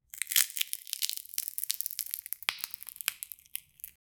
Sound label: cracking crunch of a huge